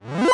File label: ball hits teleport